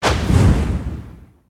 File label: victory